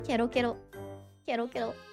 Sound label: noises kero kero